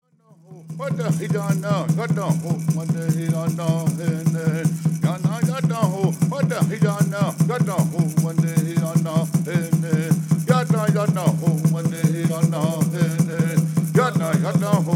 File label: Peyote Song